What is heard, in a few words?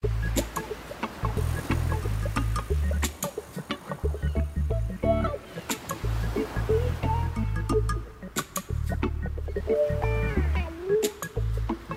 BGM MAIN; slots; Jamaican Jackpot